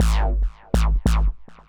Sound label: Electro Blat, Club Techno, 142 BPM; Zero-G; Construction Kits